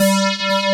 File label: SQUARE BASS; Pro-Rec - Synthsonic; BASSES